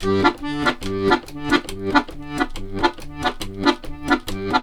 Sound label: 140, Complete Accordions